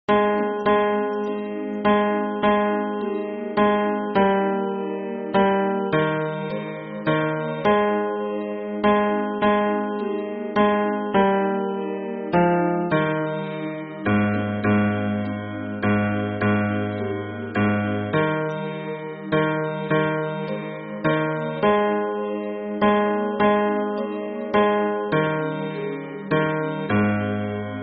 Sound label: hymn bass